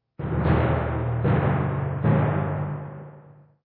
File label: Tymp Hit; Lost Zasad